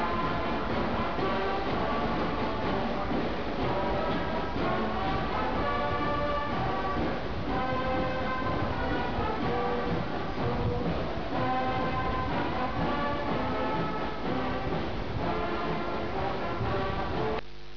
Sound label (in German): marsch